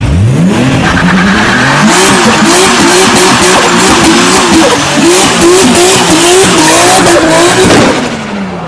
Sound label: Toyota Supra Burnout